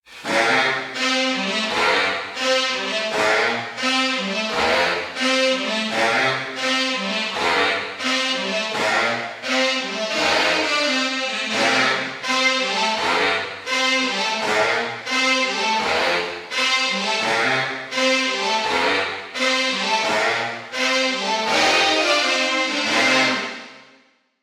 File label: sax wet